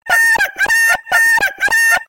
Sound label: crazy hen; animals